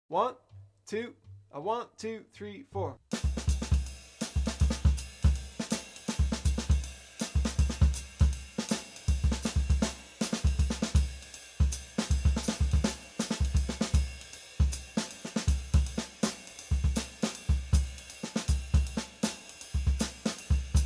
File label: Comp Ex. fast, Bop Drumming; The Art Of Bop Drumming; Riley